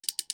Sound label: wind up